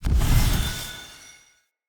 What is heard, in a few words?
Smoke Burst; Verminia